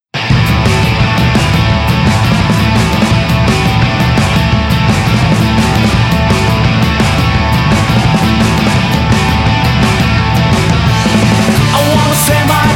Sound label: the brilliant mexican blues